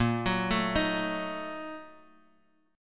A sharp Major 7th Suspended 4th Sharp 5th, Guitar Open G